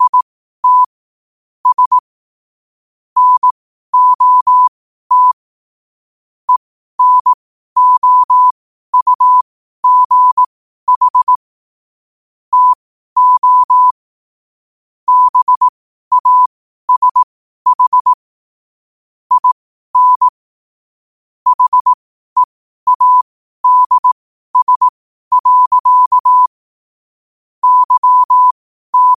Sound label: 5 wpm, morse